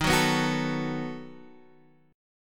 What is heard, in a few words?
B 7th D sharp